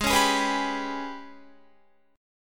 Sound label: Ab Minor Major 7th Double Flat 5th, down, Guitar; strummed; AbmM7bb5